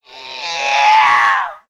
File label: yeeow, voice; ooh ahh; ow ouch